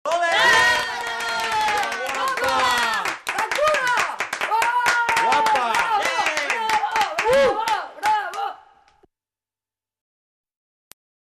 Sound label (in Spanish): mas aplausos de crios